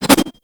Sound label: Tonfa Parry Whoosh; Warframe; Tenno